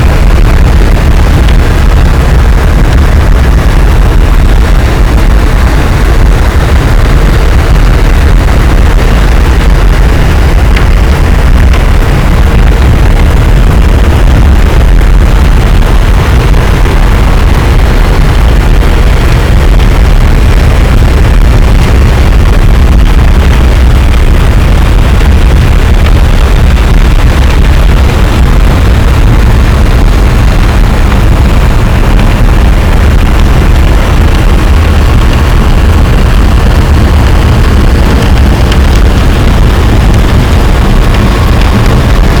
cachalot, boat; Physeter Macrocephalus

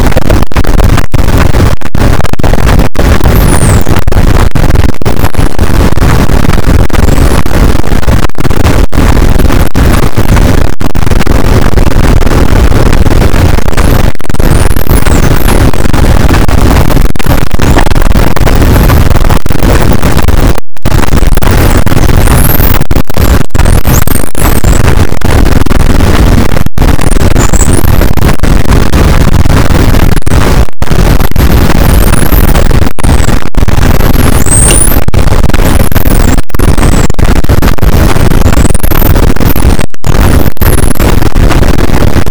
cachalot positif, boat; Physeter Macrocephalus

